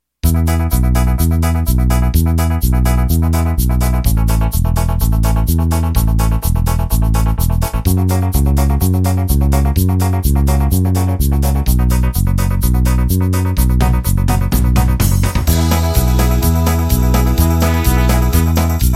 2beat 60-70s

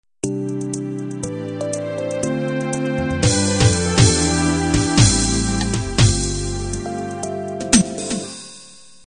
8bt analog intro

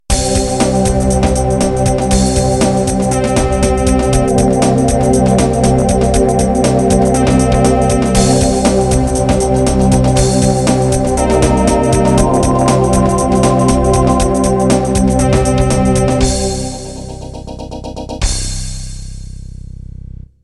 Jungle end

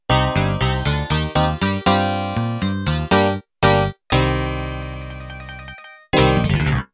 Country Rock End

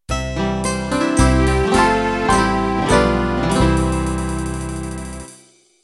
Country Waltz end

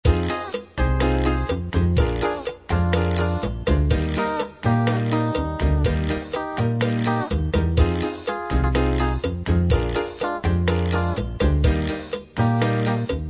jazz chacha

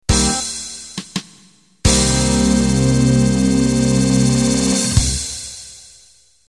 Euro 2Beat End

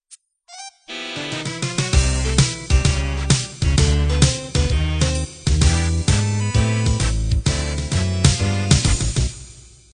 swing band intro

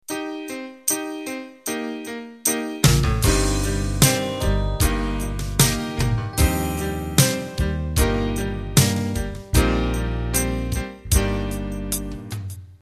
Cavern Pop Intro

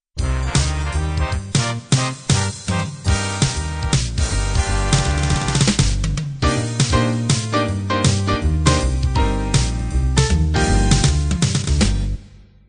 up swing intro

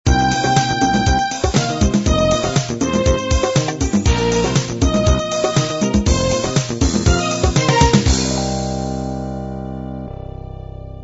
disco miami end

